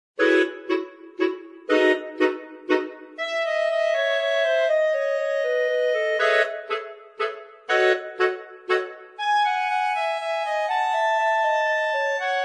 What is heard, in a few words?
La Fisarmonica; Instrumentais